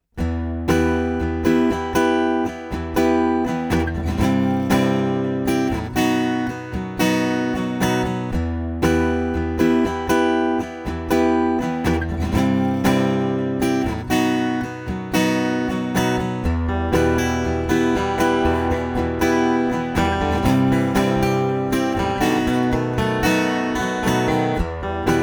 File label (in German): fame looper strumming plus verzerrte solostimme